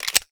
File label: mp5 mag in